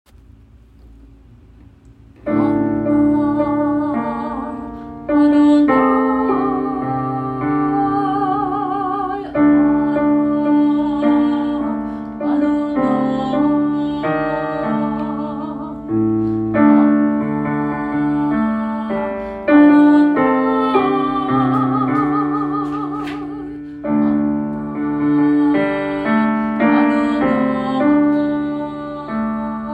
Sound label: Soprano; Shirei Chesed Fall 2025 Recordings